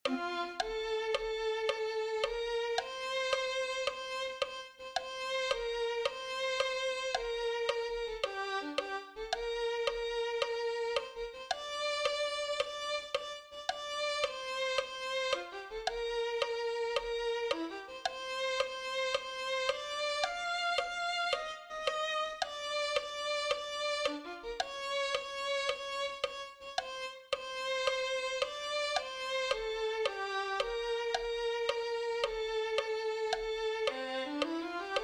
violin 2

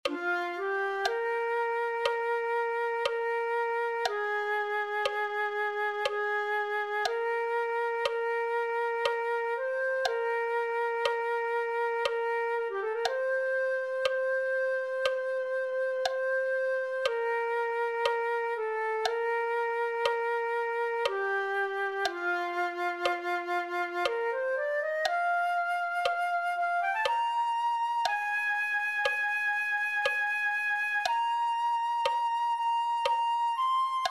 flute

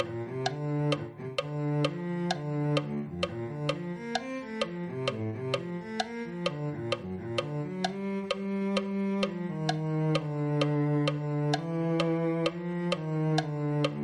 cello

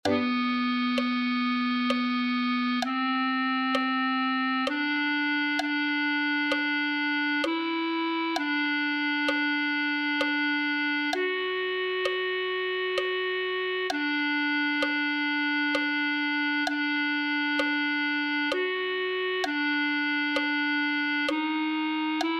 clarinet

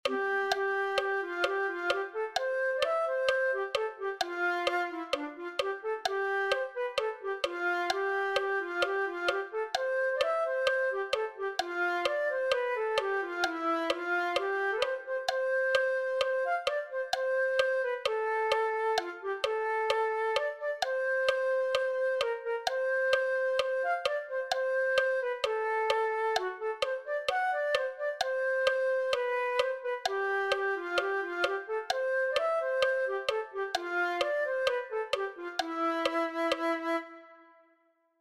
flute 1

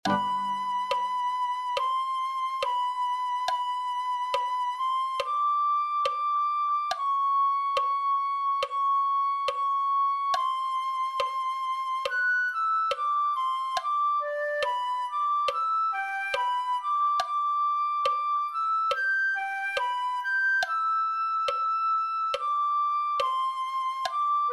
flute

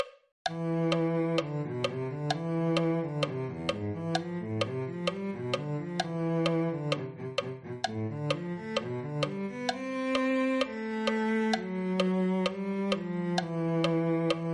cello

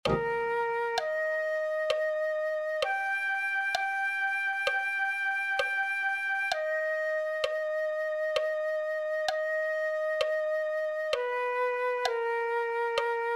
flute

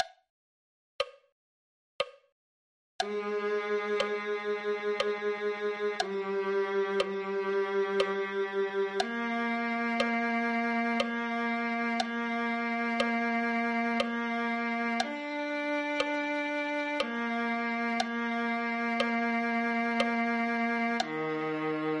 viola

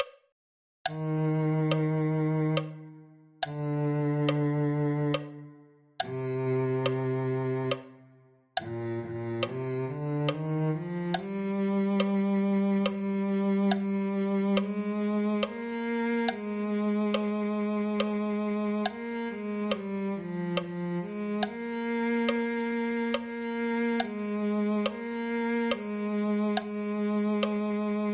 cello

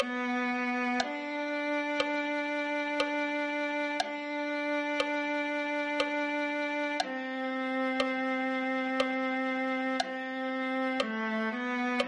viola

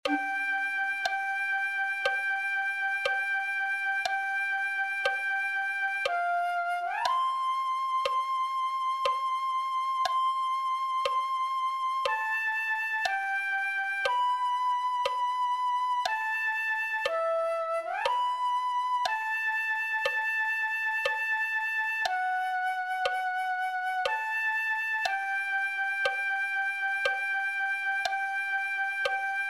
flute 1

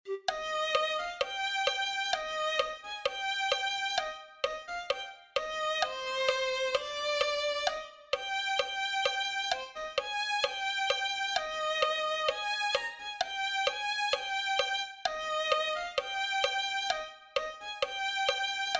violin

